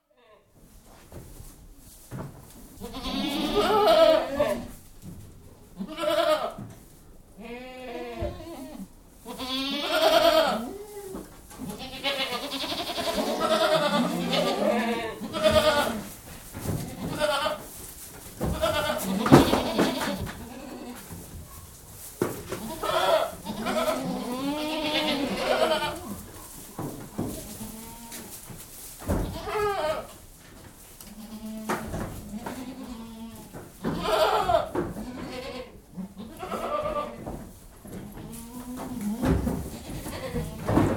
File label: Goats, interior, being fed with lots of bleating & movement (general atmosphere); BBC